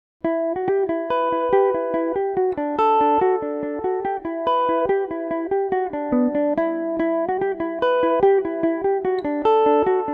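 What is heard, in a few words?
E dorian, irish mandolin; johnnys wedding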